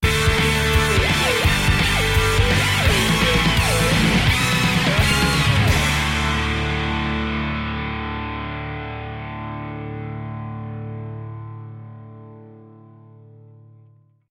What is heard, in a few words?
invigorating sting